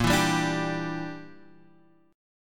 E Minor 7th Flat 5th A sharp

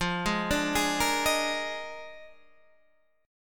D sharp Major 7th Suspended 2nd Suspended 4th F

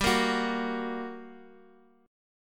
G Minor Major 7th G down Guitar Half Step Down

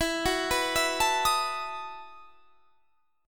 E Major 7th Suspended 2nd Suspended 4th; EM7sus2sus4 arpeggiated

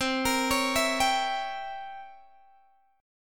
C 7th Flat 9th C Guitar Open G

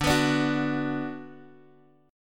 D 6th D down Guitar Drop D, chord

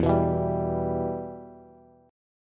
E-Minor, Bass; strummed; JGuitar

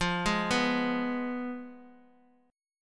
F Diminished Bass Standard; Fdim arpeggiated; JGuitar

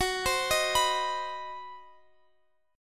C Minor Major 7th Flat 5th, F sharp, chord

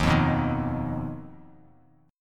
Eb Suspended 2nd Sharp 5th; Ebsus2#5 strummed; JGuitar

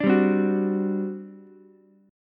G Major 7th Suspended 4th, G, down, Ukulele; GM7sus4 strummed; JGuitar

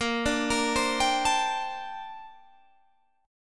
A sharp Major 13th, Guitar Standard; 18,17,20,17,20,17; A#M13 arpeggiated; capo=3

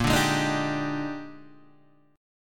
A sharp 13th, Guitar DADGAD

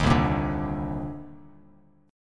C Minor 9th, Bass Standard; strummed; JGuitar

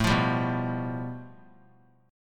A 7th Suspended 2nd Sharp 5th; A7sus2#5 strummed; JGuitar

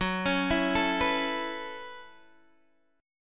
F sharp Minor 11th, Guitar, DADGAD; F#m11 arpeggiated

